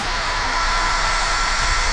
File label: whoosh